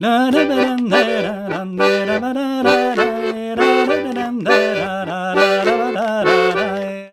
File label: Accordions, BOUREE; USB Soundscan vol.40; AKAI